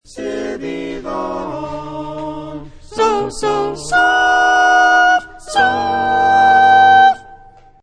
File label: sop, chorale